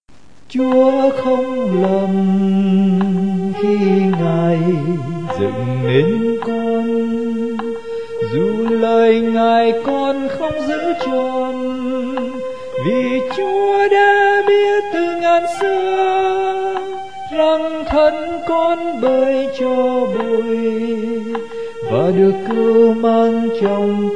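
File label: soprano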